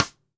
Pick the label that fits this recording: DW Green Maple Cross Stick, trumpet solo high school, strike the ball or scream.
DW Green Maple Cross Stick